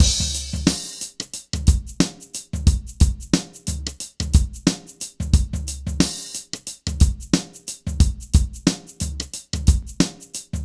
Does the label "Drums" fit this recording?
Yes